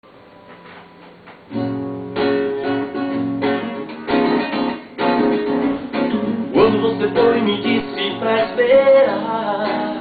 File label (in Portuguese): choro voz violao